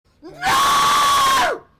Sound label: scream lower